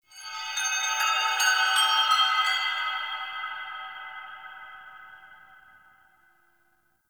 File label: Chimes; Warframe; The New War; Narmer Outpost